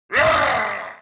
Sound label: Monster Killed; Platformer1